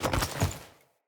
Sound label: Grunt Foley Body Fall; Enemy; Sinecure; Melee Grunt